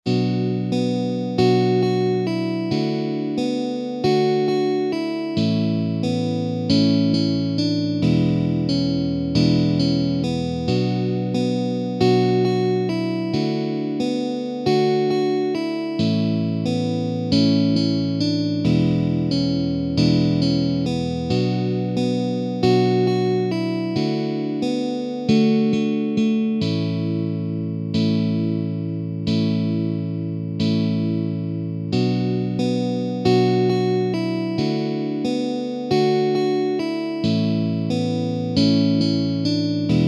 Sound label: Verse Slow Tempo, guitar